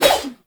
Small Swing Spin, Weapons; Dual Zoren Prime

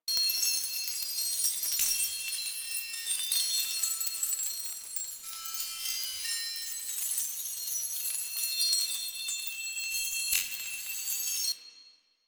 Investigate Victim Loop; Warframe; Cephalon Weave

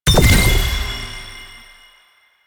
Gunbrella Alt Fire Notifier, Weapons; Warframe; Tenno; Koumei

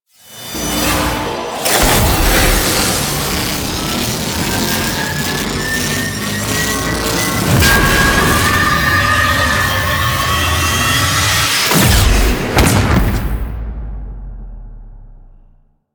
Beam Battle SFX; Warframe; The New War; Ballas Lotus Beam Battle

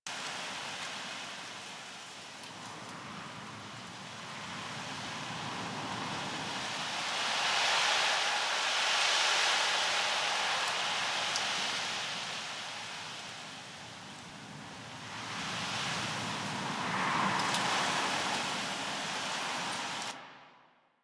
night wind grass small loop; Ambience; Props; Loops; Warframe; Eidolon Plains

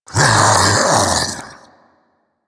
Infested Splitter Vocal Attack Medium; Warframe; Grey Strain

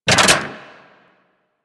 Saw Blade Launcher Fully Charged; Warframe

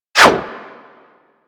Bullet Parry Metal; Warframe; Bullet Parries

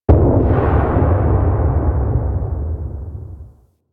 Space Exterior Explosion B; Warframe; Corpus Space

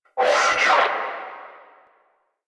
Scan Vocal Stop; DE; Warframe; Frumentarius

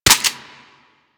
Fire Mech, Weapons; Warframe; Boar

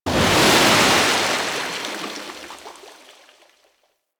Ocean Wave Crash Large; Ambience; Warframe; Grineer Ocean

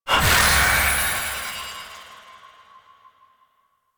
Puzzle Confetti Burst; Warframe; Duviri; Bombastine Encounter

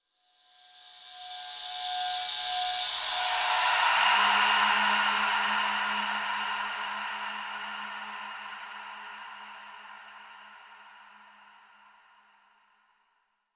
Throne Room Transition; Warframe; The New War; Finale

